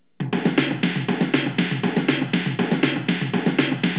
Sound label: drum